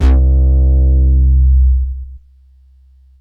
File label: Bass Synths hits and octaves